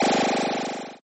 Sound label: laser shoot chiptone